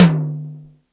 TOM, Jungle; Gorge Sample Pack Vol.1; San Gabriel

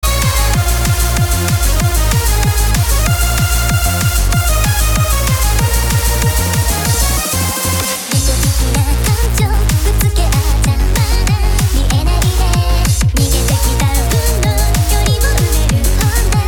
Hardcore Bootleg

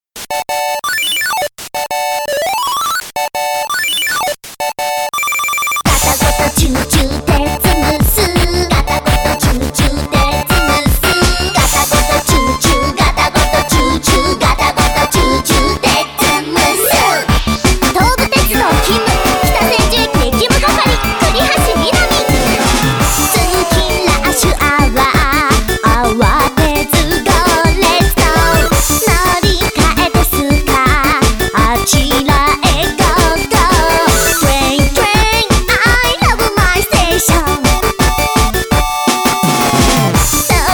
trainroll

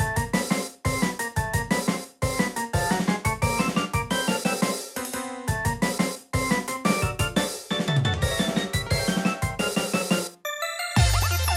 Connect Jazz Funk Mix

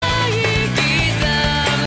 The Wolf Whistling Song

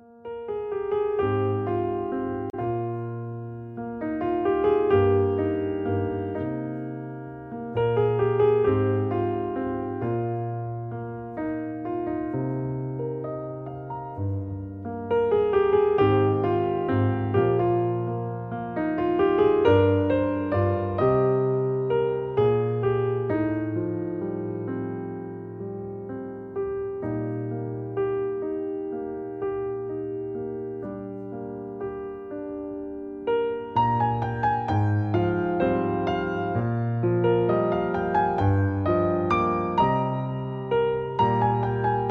Piano Solo

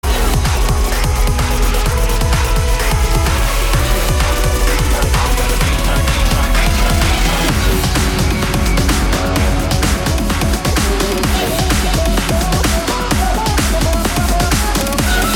Drums

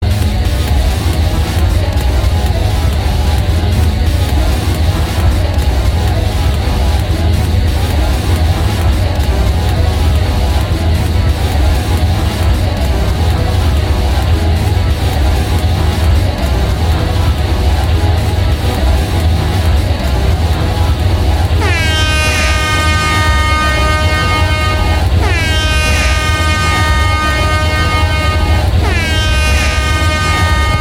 Smooth Jazz Cover

